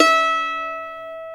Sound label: MANDOLIN E5; AKAI S-Series CD-ROM Sound Library VOL-7